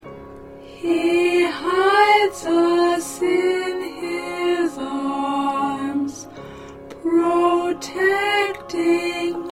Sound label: Alto, choir